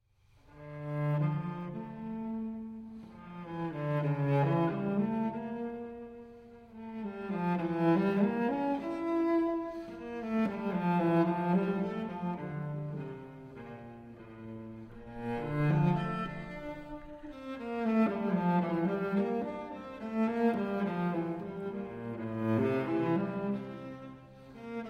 Unaccompanied Cello Suite No. 2 in D Minor Prelude; BWV 1008